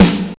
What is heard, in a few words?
bassy snare single hit f sharp key